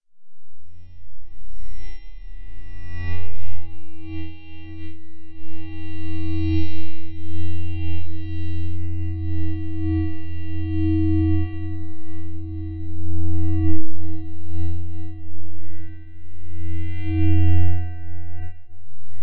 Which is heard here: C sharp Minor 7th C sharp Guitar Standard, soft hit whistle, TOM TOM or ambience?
ambience